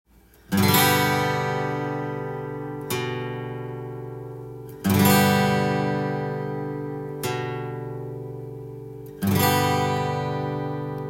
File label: chord, guitar